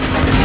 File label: close door